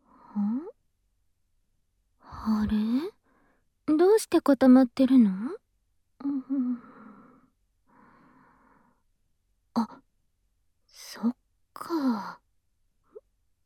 両耳舐め; 反転; 勃起チェック

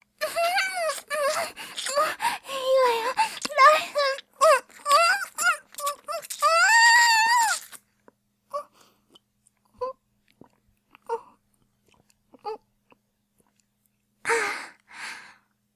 淫乱系音声素材, ごっくん; Honey Drop

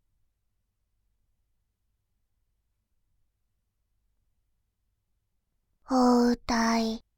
包帯, バイノーラル右, ASMR

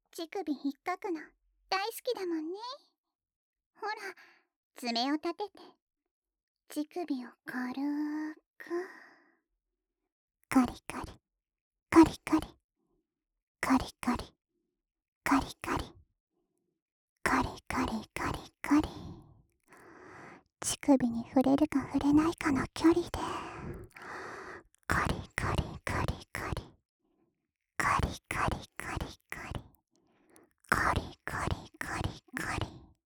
カリカリ ふつう; ロリ; ループ音声

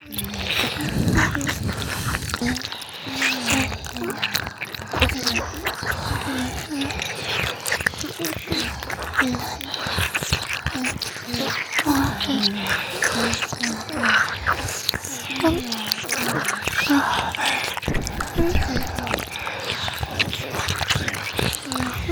四倍耳舐め; ビッチ姫の耳舐め魔法！?

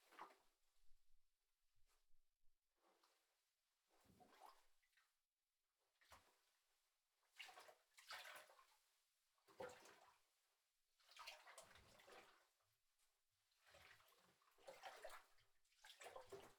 泡風呂ちゃぷちゃぷ; KU100; お風呂に関する音

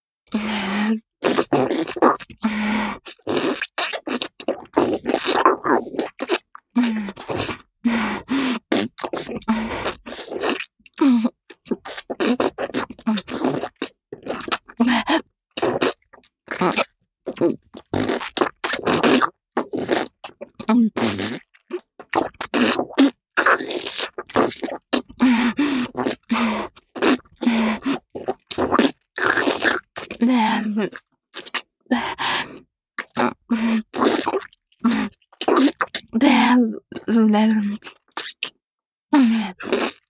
頭を前後に激しく振って陰茎を深くしゃぶる, チュパ音, ASMR

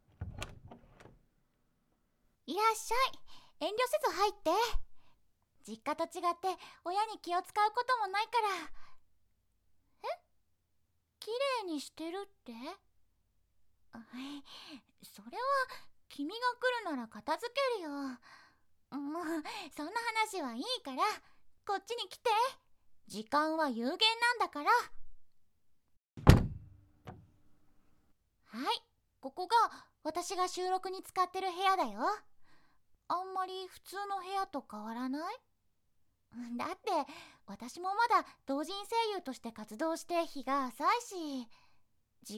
耳舐め 音声; 耳舐め音声実体験！

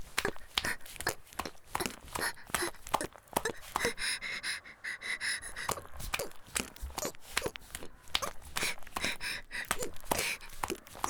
木枯らしバージョン ギシアンループ; 田舎の別の無感情少女と自己犠牲愛; おまけ (第4、5話のギシアンループバージョン)

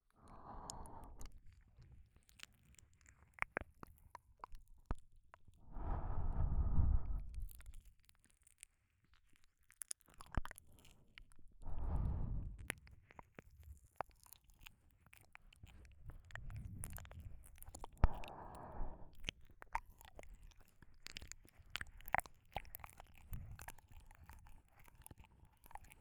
耳舐めのみ; ムチムチでダウナーなニート嫁の奉仕

